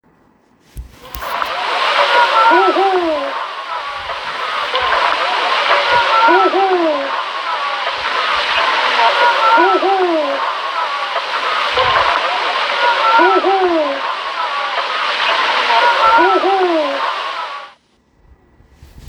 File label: Eagle owl